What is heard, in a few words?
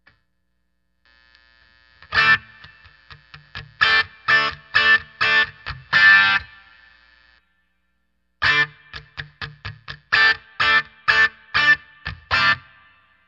pedal audio sample